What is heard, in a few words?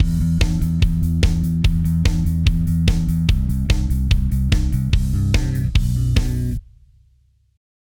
sidechain in reaper